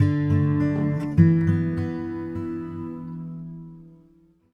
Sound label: Sure, Acoustic String; Sonic Foundry (Sony Creative Software); Friendly Fire